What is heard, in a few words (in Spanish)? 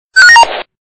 sms policia